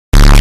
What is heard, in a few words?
teleport sound; dio